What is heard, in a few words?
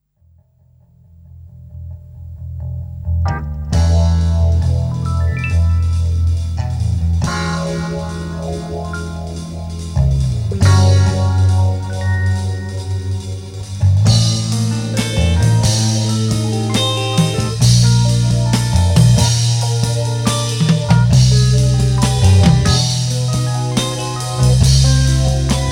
ELECTRIC CRICKET